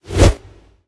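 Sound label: Power Melee; smite; god bakasura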